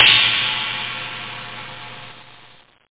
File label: sr-16 ride cymbal, drum samples; World Of A1200